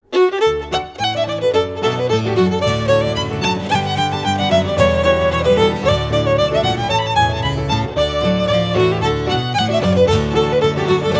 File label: Polkas; Antigonish